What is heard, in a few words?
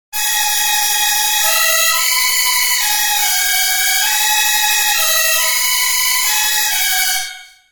Psycho Strings Mono